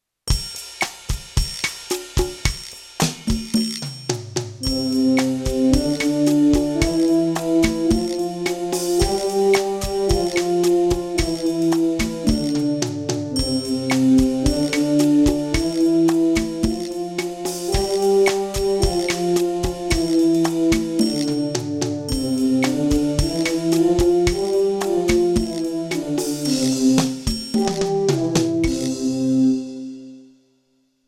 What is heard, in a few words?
G scale in Eb, brass practice